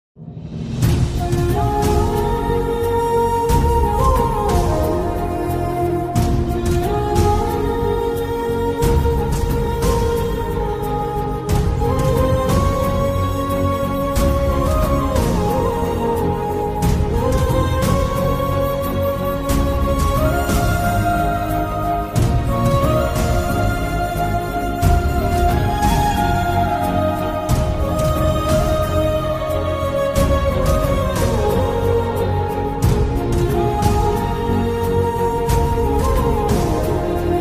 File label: sad bgm, telugu ringtone; hi nanna